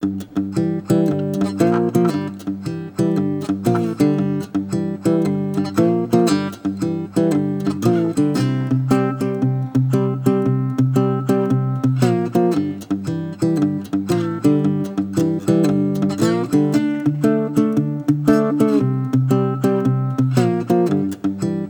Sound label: Little Guitar Blues